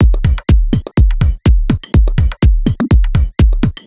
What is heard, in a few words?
124bpm, House Drumloops; Zero-G House Fabrik Drumloops